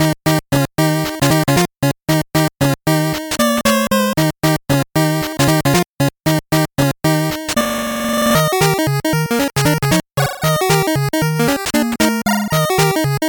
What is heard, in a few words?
8bit bgm fast